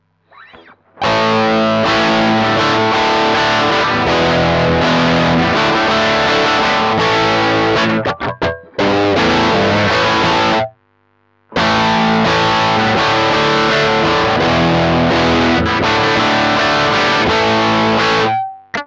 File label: crunch